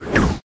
Whoosh Air Slow Mono

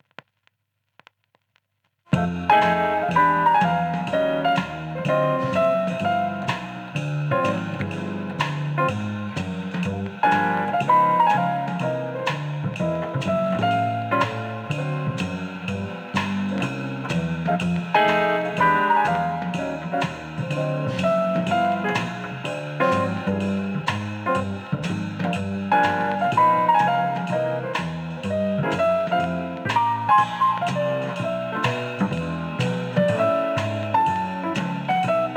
Jazz (Mono)

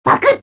Gasp!; Gator Panic

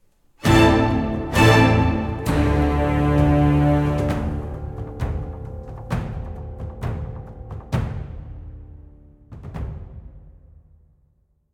Music Battle

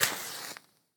Light Candle; The Town

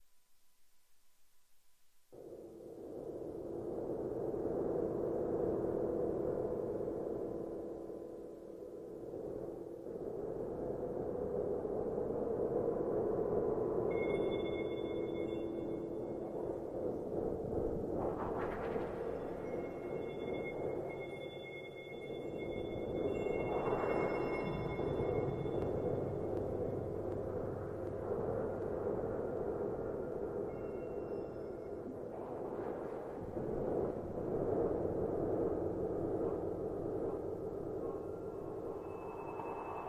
Wind Chimes; junk jack mobile

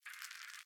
Egg Shake; The Inn

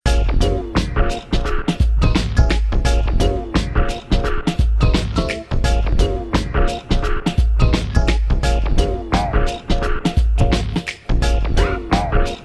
Battle Sound; bomberman playstation psp

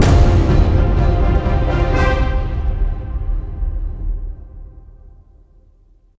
medium task complete; Daemonheim

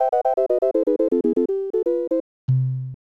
Unused Jingle

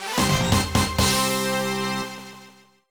Vs Jingle; Shounen Sunday x Shounen Magazine Nettou Dream Nine; NDS

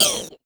Monster Hurt

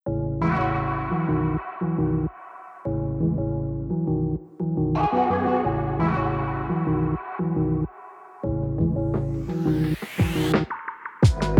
Hip-Hop; IKEA Smart Home Planner

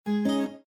Pop-up Blocked

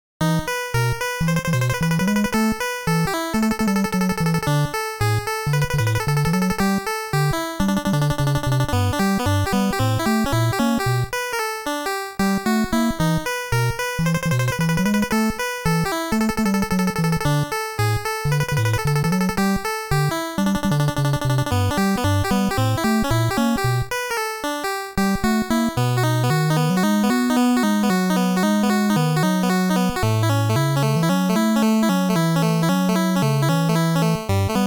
Main BGM ~ Clear; Game Sound Museum Namcot; Mappy; Mappy Land; Mappy Kids

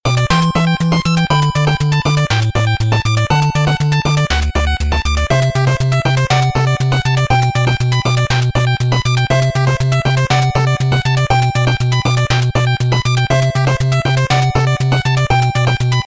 BGM Ending 8bit; Mighty No. 9; 8-bit soundtrack

